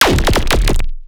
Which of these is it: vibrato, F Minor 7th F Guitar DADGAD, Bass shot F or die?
Bass shot F